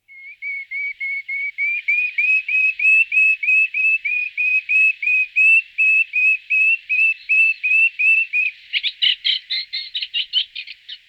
Rakosnik tamaryskovy Acrocephalus melanopogon

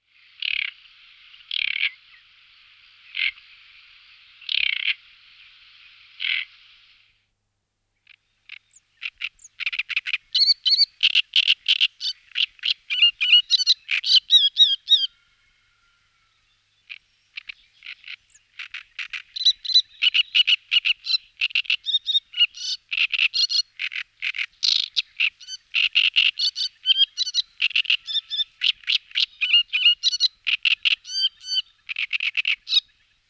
Rakosnik velky Acrocephalus arundinaceus

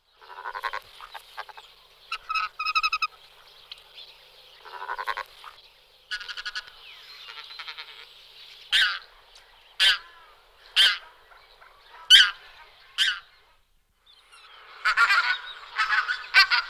Husa kratkozoba Anser brachyrhynchus

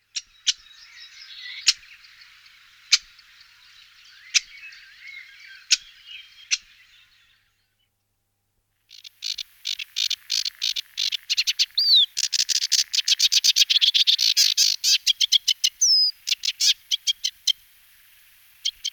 Rakosnik prouzkovany Acrocephalus schoenobaenus

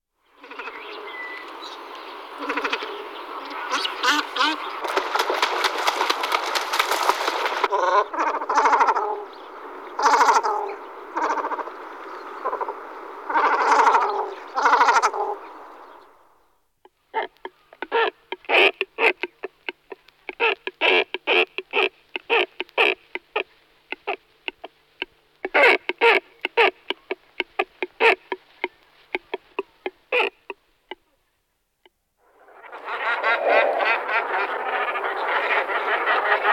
Kormoran velky Phalacrocorax carbo

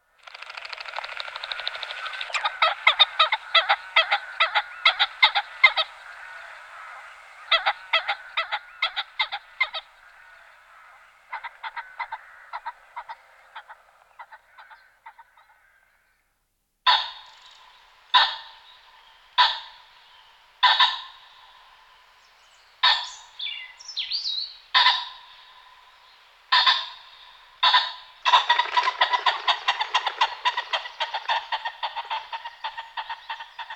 Bazant obecny Phasianus colchicus

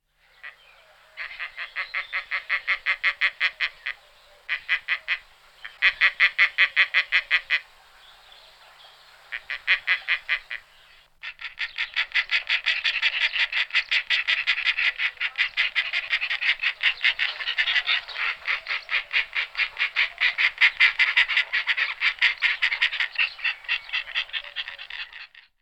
Sup mrchozravy Neophron percnopterus

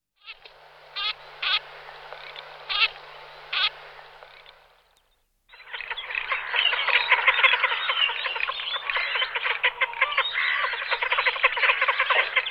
Cirka modra Anas querquedula

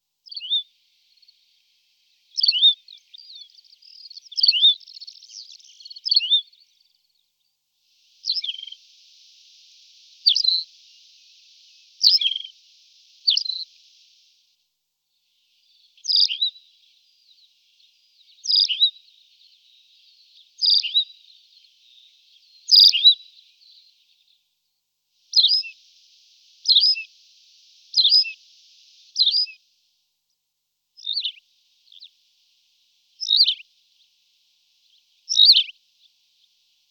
Linduska uhorni Anthus campestris

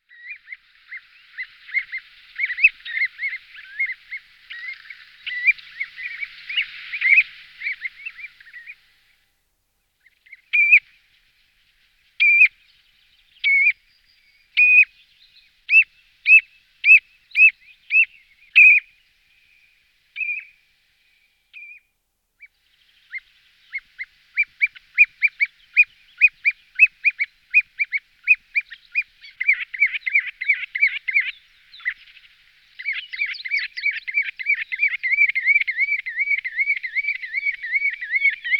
Kulik pisecny Charadrius hiaticula

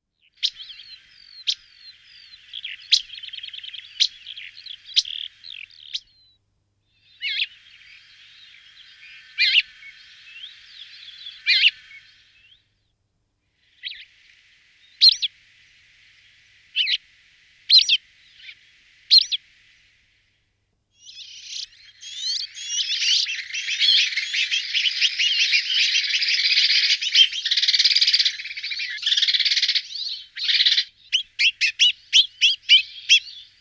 Vrabec skalni Petronia petronia

